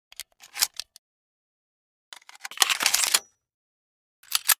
Load Gun No Silencer; Yesterday Origins; Switch, PS4, PC, iOS, macOS

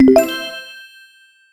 Cursor Potion; The Princess and the Frog; wii windows; Hub Swamp; Cursor Potion Match

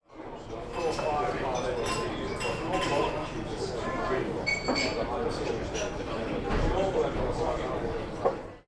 ambient inn; Balrum

